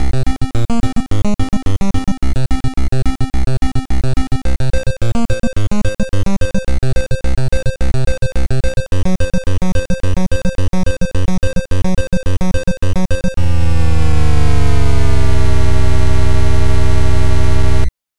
Landing Sequence; solar jetman nes

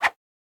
Spawnin Whoosh; boomerang fu

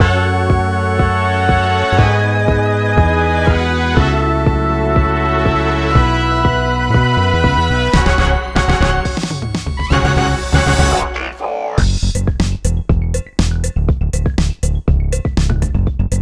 Funky, Instrumental; Meta Runner Edition; If Mario Was in Friday Night Funkin

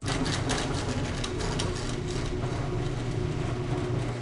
Garage Door Move; Lethal Company

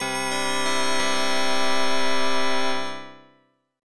Round Clear; blockout; arcade

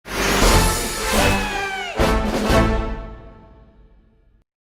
(Jingle) Rescue Mission Successful!

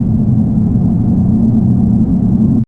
Space Noise; Effects; Scratch

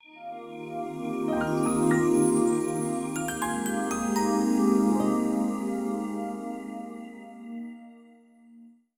Jingle Job Accepted; streetpass mii plaza; flower town

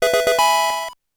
Fanfare - Level Up!

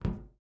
Footstep Metal; Among Us

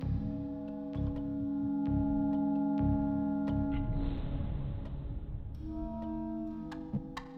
Heart Calm

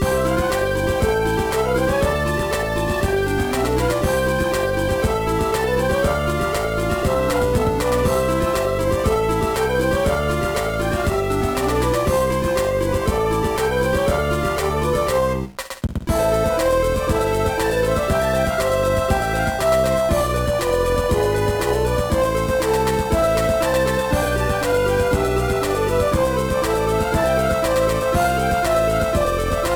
BGM; Pinky Monkey Town; gba

